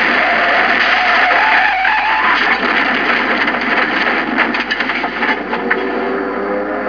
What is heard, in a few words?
sport hit